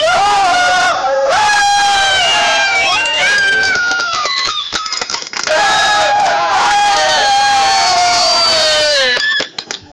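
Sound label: female soldier got whipped, pain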